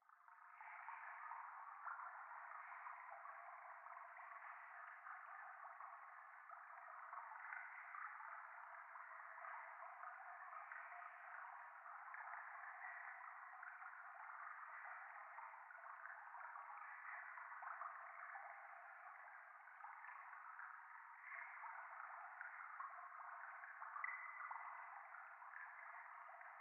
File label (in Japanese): 廃墟 左耳密着吐息 川のせせらぎ